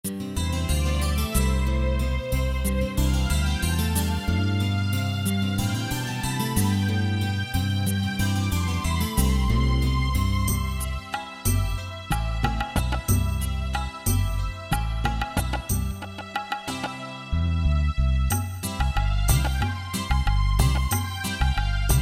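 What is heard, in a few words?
GAZAL C major, India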